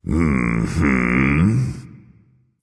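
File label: nonverbal agree; smite; voicepack; ullr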